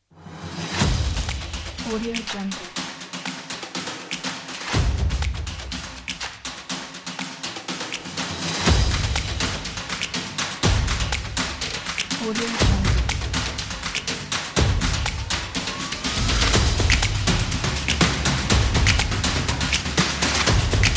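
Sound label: cinematic epic percussion and drums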